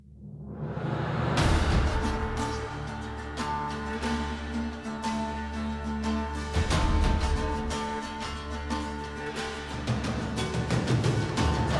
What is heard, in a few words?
battle; PhoenixCraft-1.16.5; ambience music